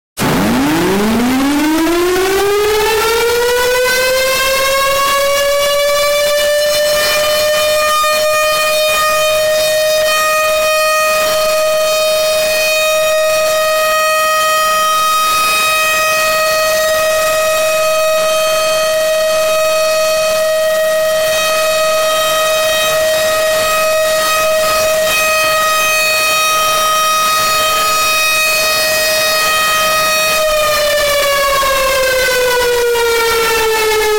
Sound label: tornado siren extremely loud